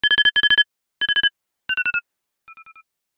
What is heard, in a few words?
doppler shifted ringtones ios